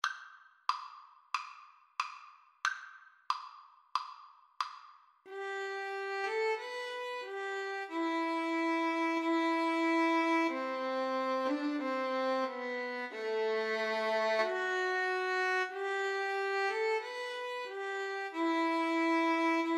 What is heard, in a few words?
violin duet